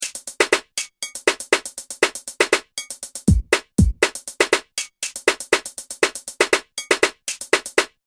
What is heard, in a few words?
beat